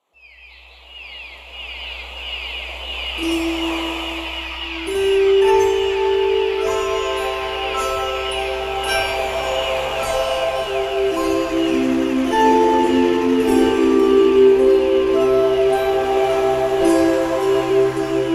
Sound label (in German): Mit Delfinen schwimmen 440 Hz